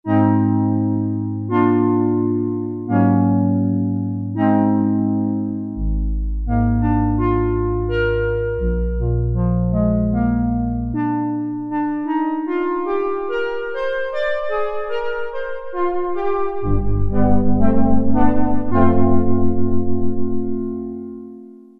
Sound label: Ensemble With Release; Sequential Circuits Prophet-5 (Ver.3); Factory Patch; File 2 Programs